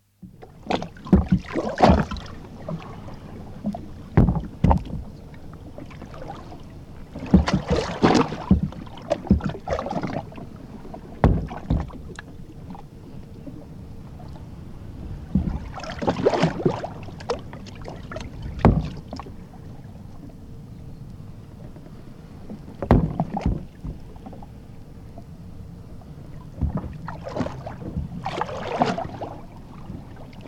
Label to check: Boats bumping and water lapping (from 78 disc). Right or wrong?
Right